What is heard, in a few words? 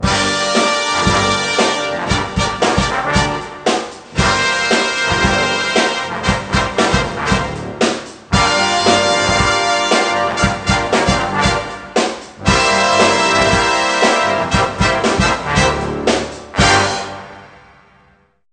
Menu Song; NCAA March Madness 98; PS1